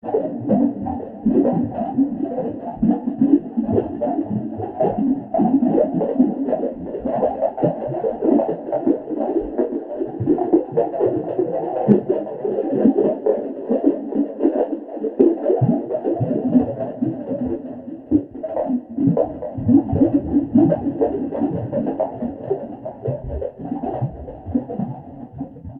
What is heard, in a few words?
Water